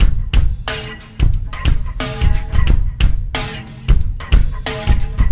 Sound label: Landing Party Beat, Processed Drumkits